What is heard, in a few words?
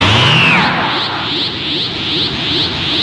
power up sound; dbz